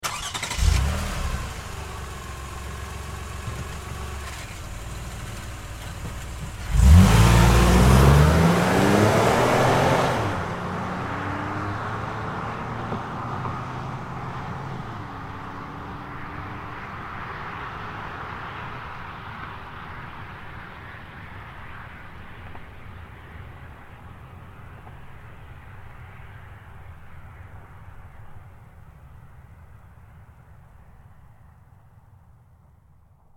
Car Highlander Start Away Fast; 2005 Toyota Highlander V6; Cars; Vehicles

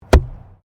Archery Straw Target Impact; Various archery sounds; Miscellaneous Sports; SoundEffects-Foundation1

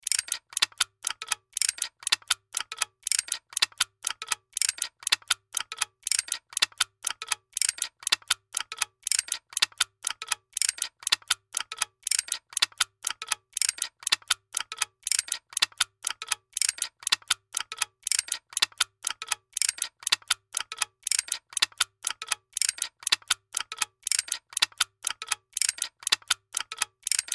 Sci Fi Workshop Machines Mechanical Steampunk; Tech; Sci Fi Workshop Machines Mechanical Steampunk Wood Movement Inventor Loop Science Fiction

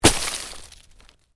Ice impact heavy, Ice: heavy impact with debris; Snow; Weather; Stuart Duffield

